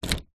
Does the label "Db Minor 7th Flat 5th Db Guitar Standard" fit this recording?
No